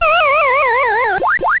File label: pacman dies